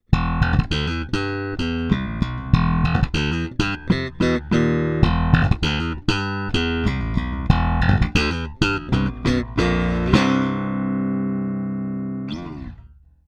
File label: Both Passive Slap